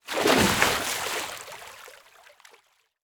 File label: Liquid Water Water Body Falls In Water